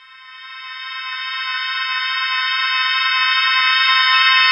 reverse pad sweep

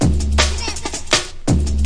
163 drumstep silly vox; 161 to 180 bpm

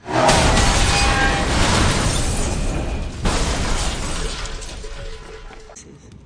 industrial accident; Sound FX Real Life

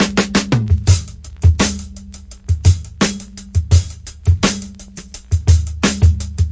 classic break, drum loops and breaks

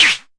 Arturia MiniBrute sn

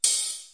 filt ohh, hats; DRUMS (SINGLE HITS)

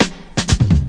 reggae break, DRUM LOOPS and BREAKS

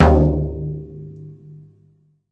pearlkit low tom, Pearl drum kit; REAL LIVE KITS

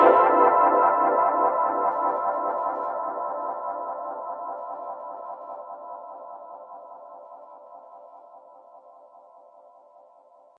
one last gasp synth fade

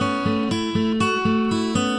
acoustic guitar picking; GUITAR LOOPS

